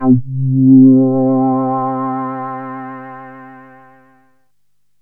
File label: synt, Juno-2; Giga Samples Collection; Bass